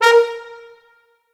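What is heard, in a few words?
Orchestral Brass, A sharp 3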